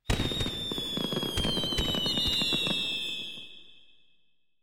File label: level win; Pirates; treasure quest